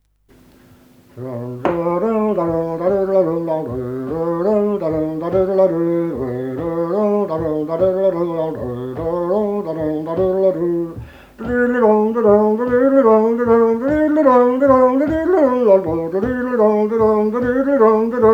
Polska (Daldans-melodi)

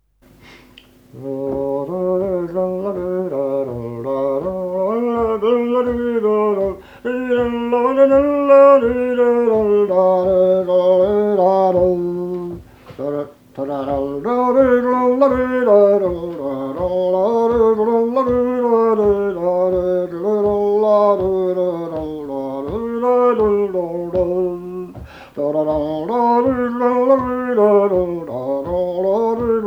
Brudmarsch; Venus Minerva

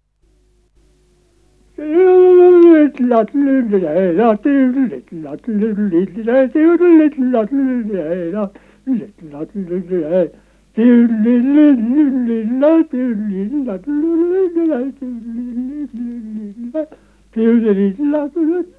Polsktrall

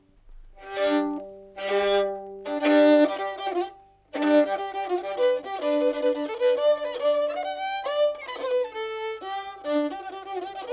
Polska från Skåne, Folk music of Småland

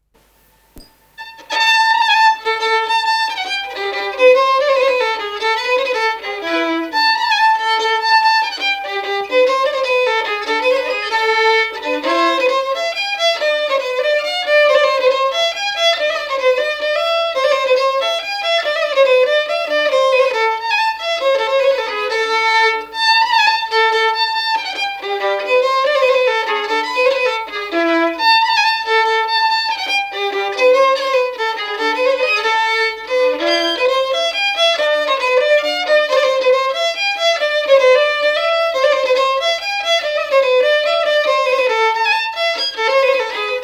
Polska; efter Erik Svensson, Skålan

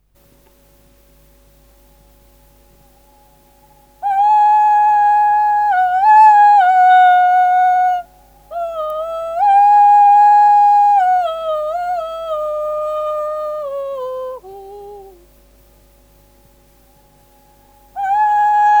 Kauklåt

